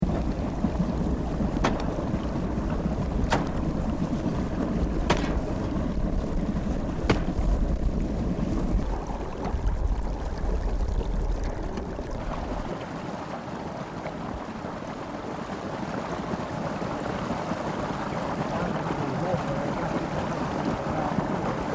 Water released into rice field, Nepal

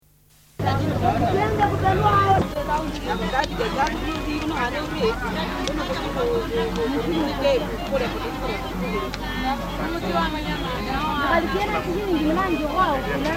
Sounds of market in Kakamega; Kenya

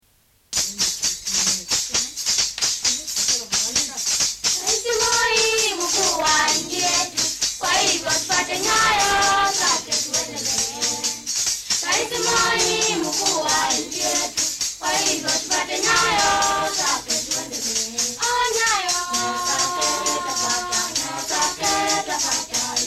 Song in praise of President Moi; Kenya

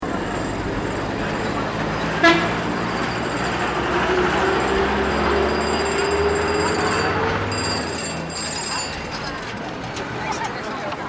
Rush hour traffic, Nepal; Family Life in Town

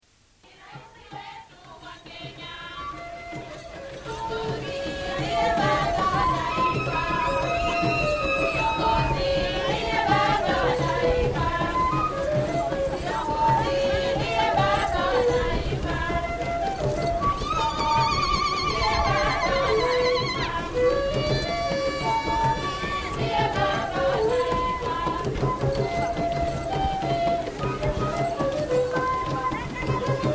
Traditional music : women; Kenya

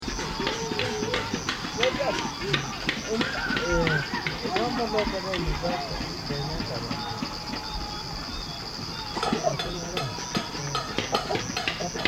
Driving out evil spirits; Kenya

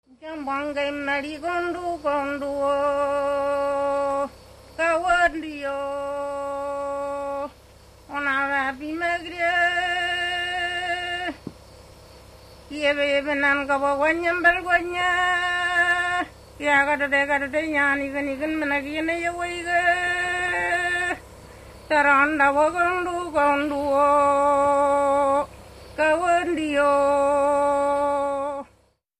cry song

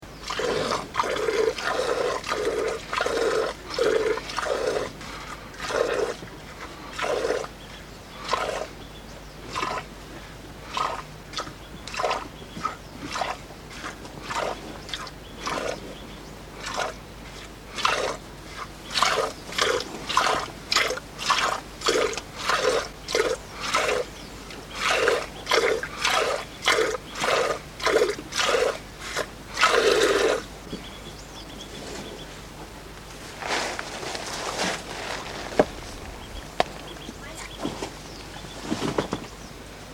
Milking buffalo, Nepal; Farm Work

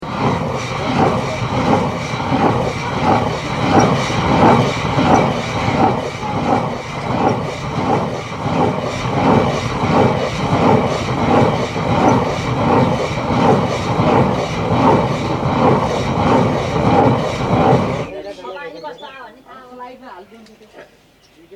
Grinding millet, Nepal

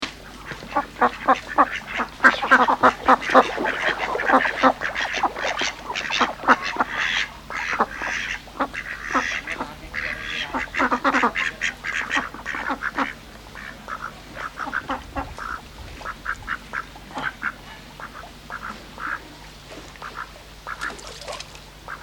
Nearby ducks, Nepal; Family Life in a Village

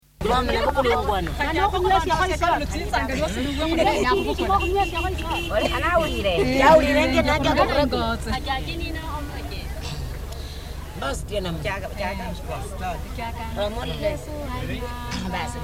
Women sing leaving market; Kenya

